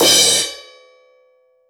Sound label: Cymbals